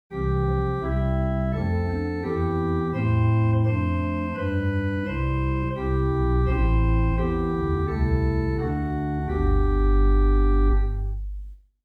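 Swell Rohr + Gems + Nazard + Tierce Great Bourdon Pedal Bourdon + Great; First Baptist Riverside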